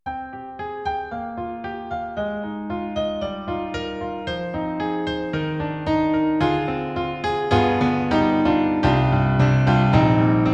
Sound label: EDIROL SD-90, Enhanced, SD Piano